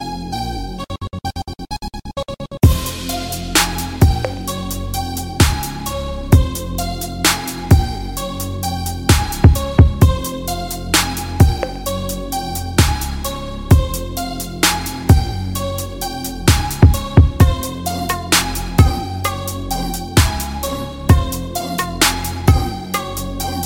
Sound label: Instrumental